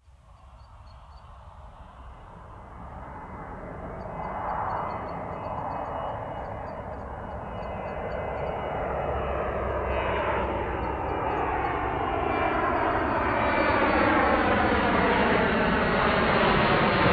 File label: Takeoff; L'olofonia è una tecnica di registrazione e riproduzione audio eseguita tramite uno specia